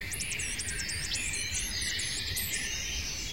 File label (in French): chants